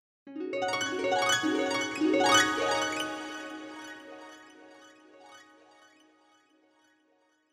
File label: dream harp